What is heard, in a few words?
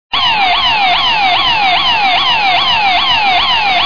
siren fast waver